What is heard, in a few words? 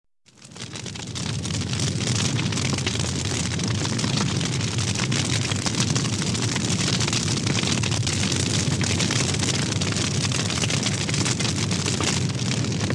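sound of raging fire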